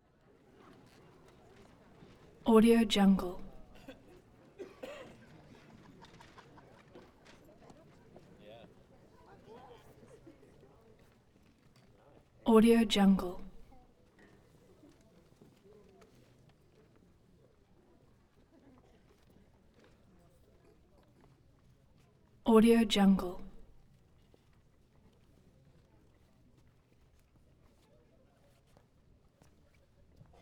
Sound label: theatre crowd